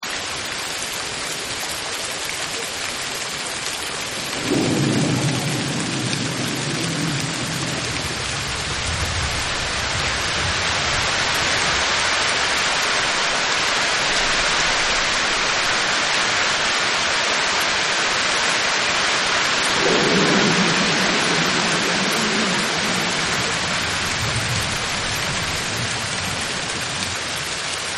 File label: Rain, Rain On Roof; Weather; Partners In Rhyme